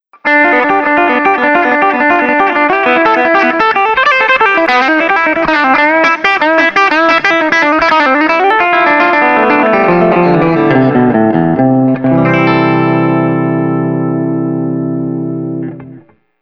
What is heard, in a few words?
NoCaster Bridge FX Fast, pickups